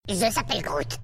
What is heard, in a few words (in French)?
bebe; Groot